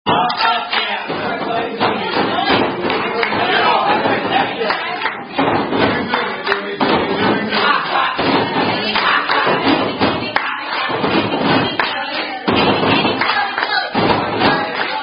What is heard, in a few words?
Drinking Game; Zoomie!; Zoomy Zoomy Drinking Game